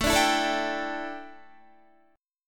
A 7th Suspended 4th, A, down, Guitar

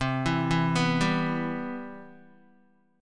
C Major 7th, Guitar; CM7 arpeggiated; JGuitar

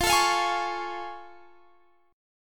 A Augmented Major 7th F

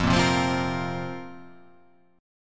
F Suspended 4th F

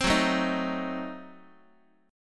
F sharp Diminished 7th, Guitar; strummed; x,x,3,4,1,2; 4A,4E,5A,5D,5G#,6C#

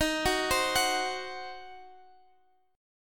Eb Minor 6th, down, Guitar, Half Step Down; arpeggiated

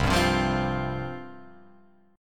C Suspended 4th C, Guitar Open F; Csus4 strummed; JGuitar

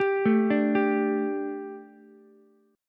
G Suspended 2nd G, down, Ukulele; Gsus2 arpeggiated; JGuitar

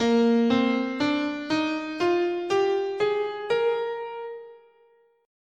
A sharp Mixolydian, scale

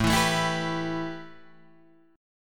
A 7th Suspended 2nd Guitar Drop D; 7,7,7,9,8,7

